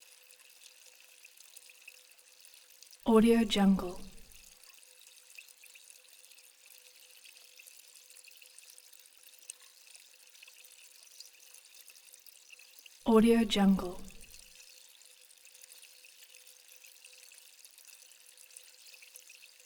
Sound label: bubbles soda